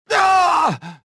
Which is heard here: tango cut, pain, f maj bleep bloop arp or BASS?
pain